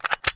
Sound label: Pistol Magazine Insert; TrueCrimeStreetsOfLA; XBOX